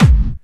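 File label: BD Clubby, bass drum; VEC1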